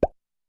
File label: chpok